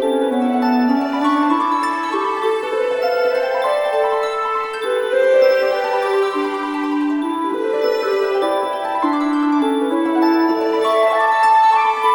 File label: long bird pickup; Toki Tori 2+; Toki Tori Collection